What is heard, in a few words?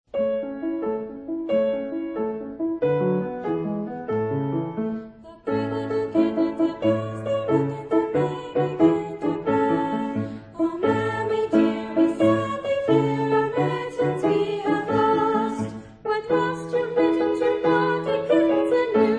Nursery Rhyme Music